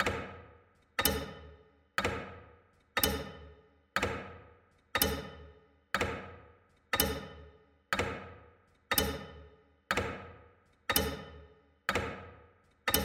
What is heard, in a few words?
tictac loop black